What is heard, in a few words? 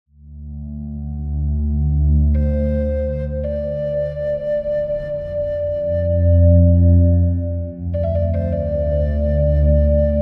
native american flute and acoustic guitar; Unity3D